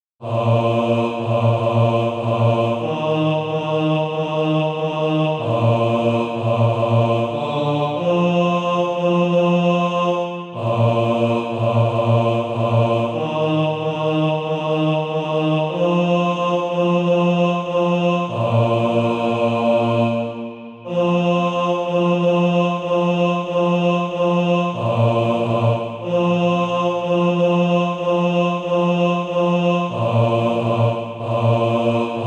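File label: bass, SATB Practise Music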